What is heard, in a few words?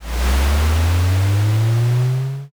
rocket launch